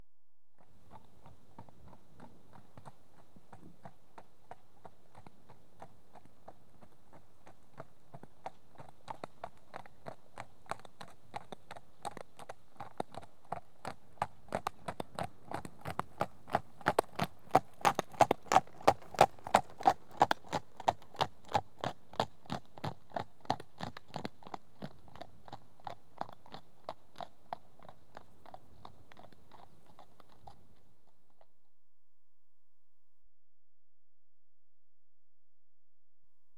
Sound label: One horse trots past on tarmac; Horses; BBC Sound Effects Library